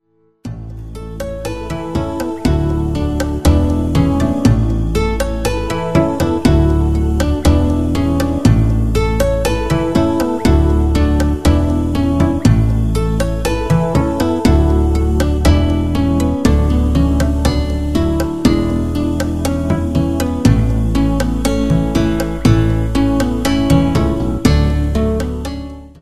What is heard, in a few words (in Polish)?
muzyka bez oplat